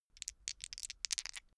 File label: dice shake hands open short